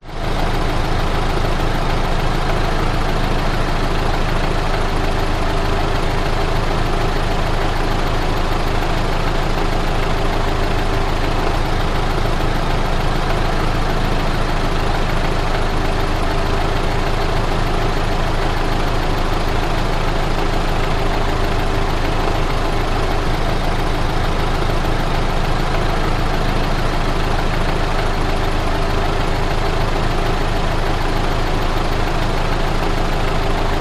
car engine idle underneath car middle ext pov toyota corolla; vehicles